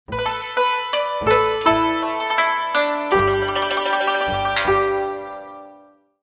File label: Peaceful Village, Sting